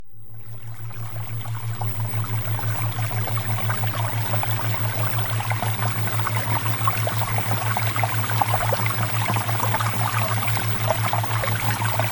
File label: Water Theta Waves; SEDUCTIVE MAGNETISM; Premium Subliminals; Success With Men